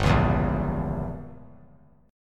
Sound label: G Diminished 7th; strummed; JGuitar